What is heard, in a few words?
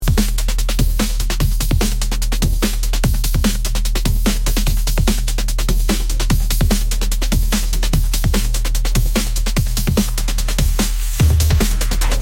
rage unbound, agressivnaya